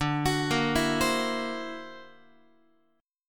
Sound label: D Augmented 9th down Guitar; D+9 arpeggiated; JGuitar